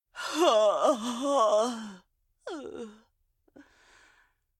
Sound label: Agony Pain Standing Still; Zara